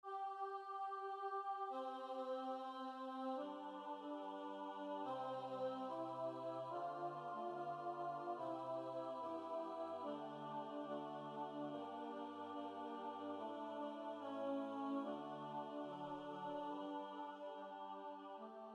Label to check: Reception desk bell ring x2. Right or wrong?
Wrong